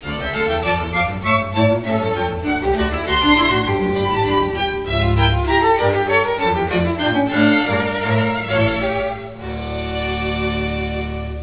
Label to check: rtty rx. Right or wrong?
Wrong